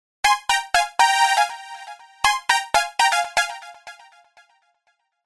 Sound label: iphone 3g ringtone